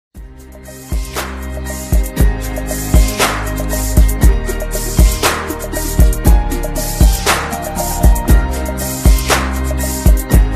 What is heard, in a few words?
music ringtone, apple ringtones; glitter